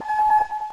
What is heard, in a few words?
Uproar; pokemon reborn e19 2022